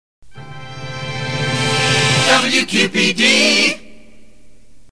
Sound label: jingle short; WQPD